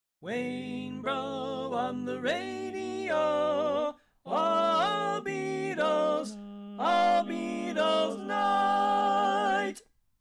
Tenor Left; Pennsylvania Groups

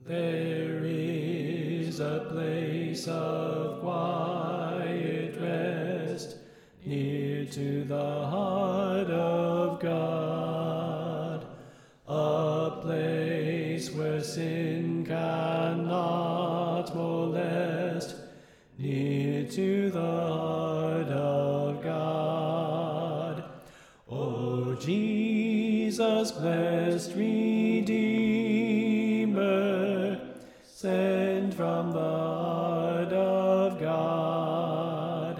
Soprano Left

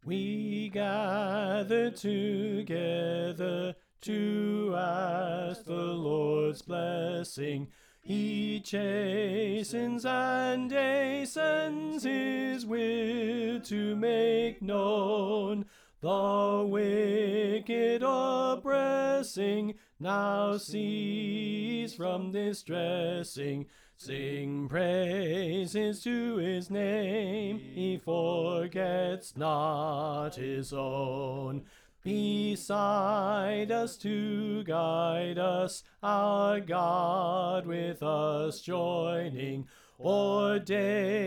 We Gather Together Soprano Left

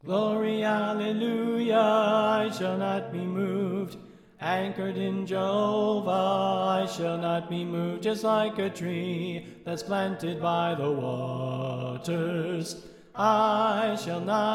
Soprano Left

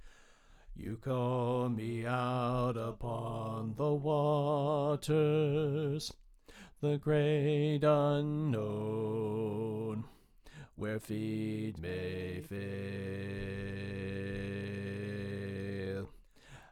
Bass Left

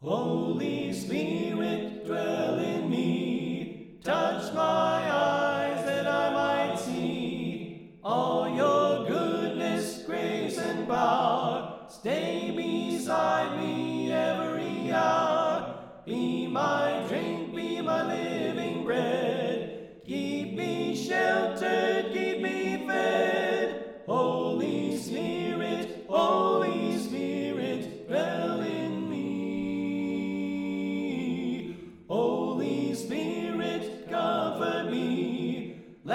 Alto Left

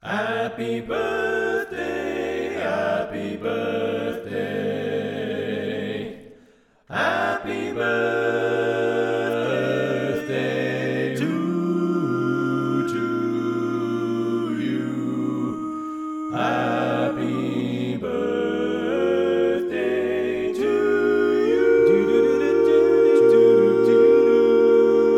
hbd acappella

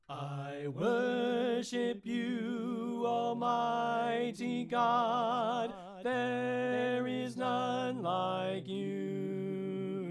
Tenor Left

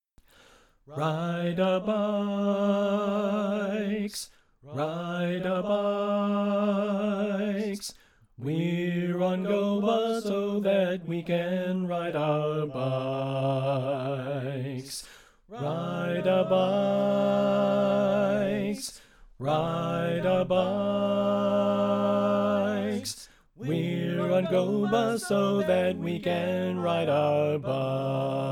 Bari Left

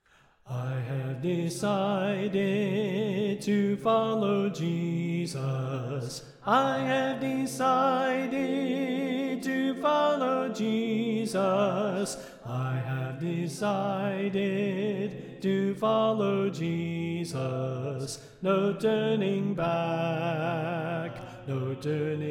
Soprano Left

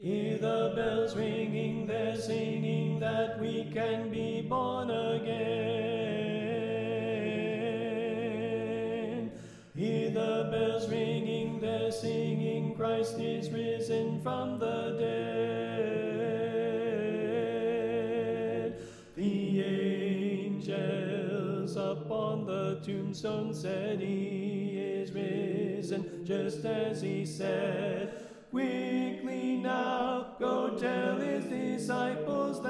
Tenor Left

